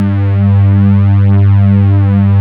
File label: MIDI pad, synth pad; InVision Interactive; Lightware VOL-1; Instruments & Percussions